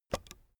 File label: keyboard external space release soft, computer